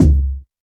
subtle reverb kickdrum sound c sharp key

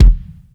small reverb kick drum single shot F key

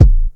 warm sounding kick single hit a sharp key; kick drum samples

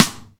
present snare single hit a sharp key

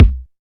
natural kick drum e key; kick drum samples

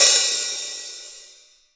large room open hi hat sound f key

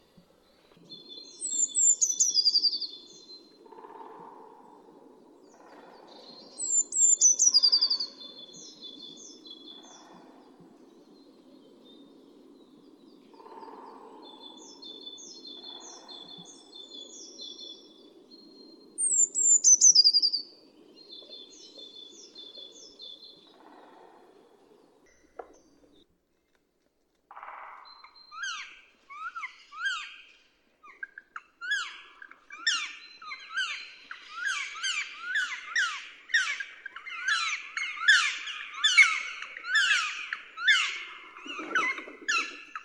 woodpecker call

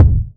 urban kick single hit g key

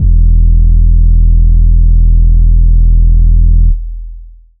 phonk kicks oneshot - 808 E, Trap kick drum; trap kick drums

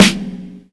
reverb steel snare drum sample g key

